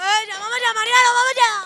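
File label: rhythmic vocal; Best Service; World Colours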